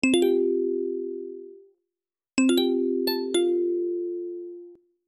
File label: alert